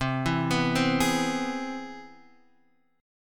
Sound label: C Augmented Major 7th C down Guitar Half Step Down; chord